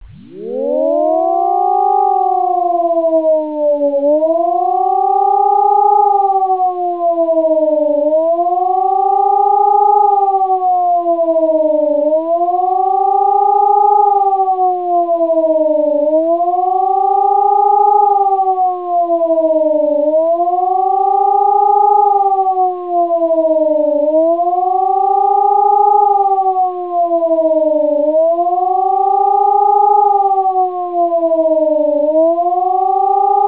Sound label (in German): Warnung; stadt emden